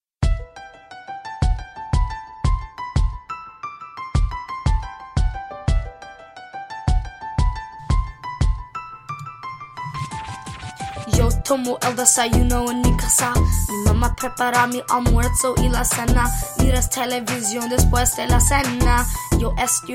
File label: spanish song